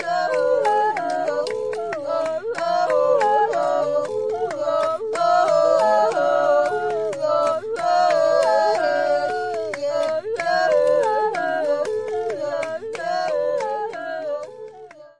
pygmies, world loops

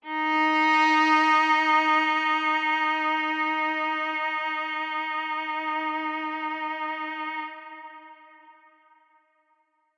Cello Verb D sharp 3, verby solo cello; PADS and STRINGS

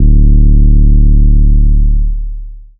trap bass; instruments multisampled; 7rystan Trap Bass

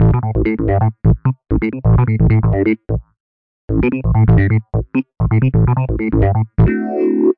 130 electric piano Amin Dor; KEYS ORGAN PIANO LOOPS

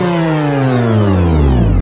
Electro FX; Ueb HE Electro FX

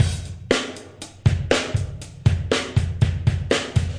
kick you over n over, drum loops and breaks

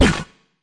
fx drum, Electro FX

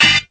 classic house, Organs

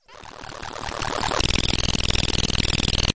farty electro helicopter; Weird and Interesting Hits

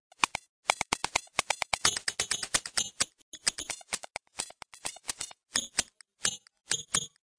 microscopics, drum loops and breaks

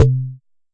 Jomox MBrane 11, drum machine; drum machines

